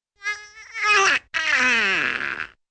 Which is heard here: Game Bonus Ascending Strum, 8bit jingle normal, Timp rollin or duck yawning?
duck yawning